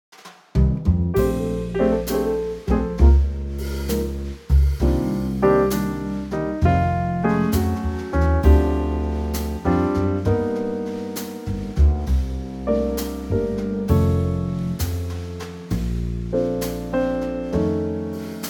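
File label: Bb Trio, Backing Tracks for Male Vocals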